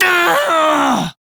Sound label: Death Male; Dialog; en; Warframe; Railjack Crew; Red Veil